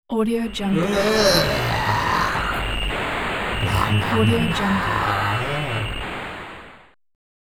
zombie invasion intercom noise

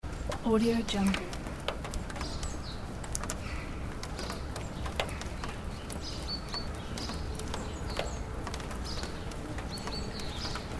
rainy weather sounds pack

